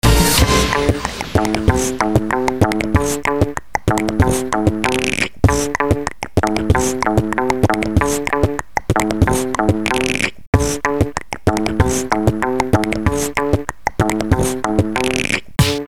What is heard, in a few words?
Instrumental